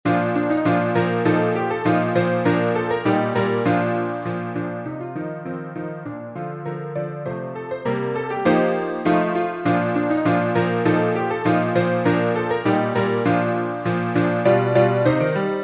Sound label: PIANO